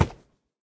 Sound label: wood, horse; minecraft